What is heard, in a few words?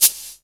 MIX PC, DRUM RYTHM; AKAI S6000 CD-ROM - Volume 1